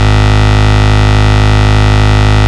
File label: Bass, Root G#; Vengeance Samples